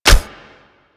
Shrapnel Shotgun Mech; Railjack

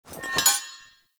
Armour Fragment Pickup; Objects; Pickups

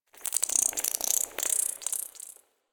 foliage insect; Ambience; Props; Warframe; Duviri

